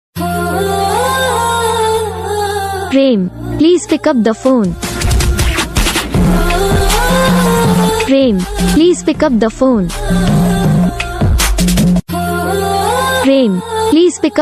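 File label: DJ remix Hindi song ringtone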